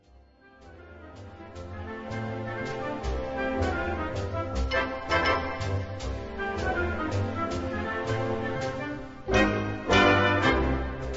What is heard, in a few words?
orchestral game concert